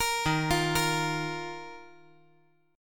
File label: D sharp Suspended 2nd D sharp; arpeggiated; JGuitar